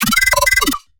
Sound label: synth hit oyoyoyoy; Virtual Riot